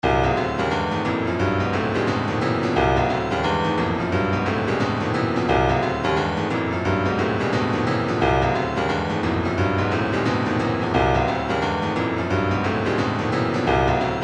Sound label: retro funk 088 wah; fly